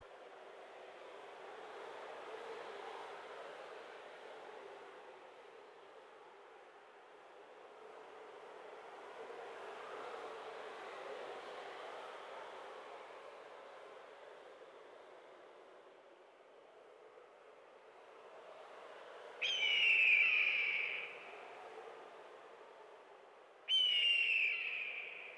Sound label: desert